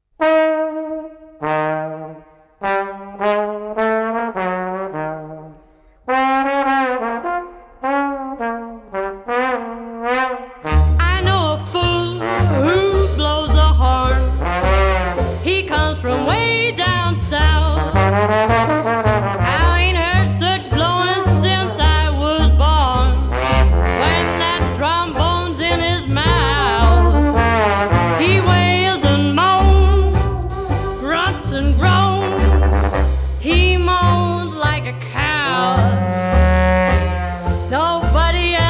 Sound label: trombone